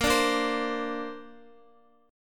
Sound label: A sharp Major Add 9th, down, Guitar; strummed; tuning 4F,4A,5C,5G,5C,6E; chordshape